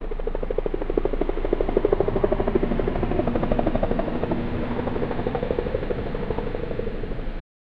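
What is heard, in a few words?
helicopter hovering at distance, binaural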